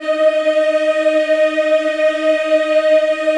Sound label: D#4, Choir; USB Soundscan vol.28; Acoustic & Synth; AKAI